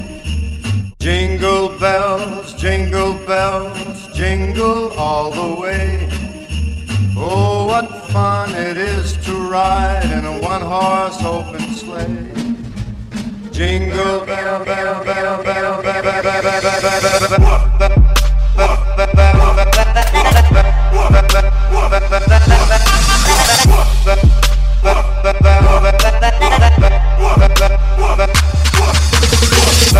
Jingle Bell

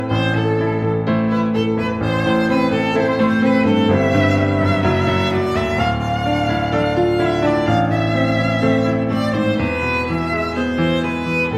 Violin, ringtone

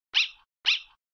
Annoying Dog

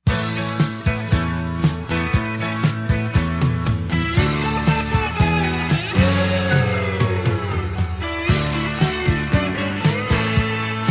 Chinese ringtone

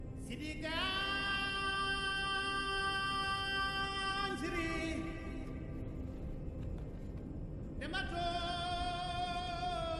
Ringtone Message

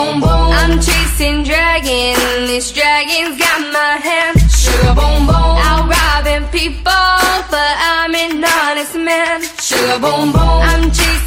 ring tone

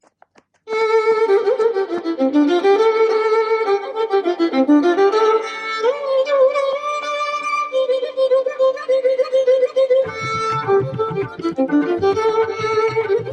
PP violin

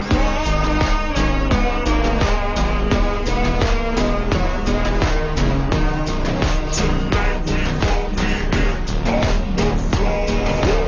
Male Version

